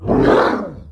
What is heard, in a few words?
Lurker Death; Jak; TPL